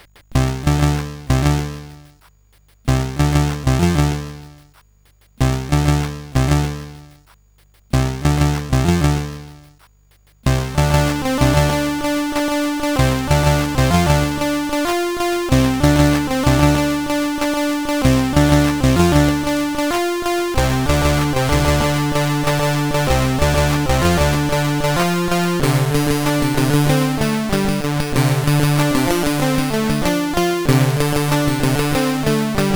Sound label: New Digi, CSG8580R5, sid